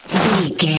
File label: footstep gravel slide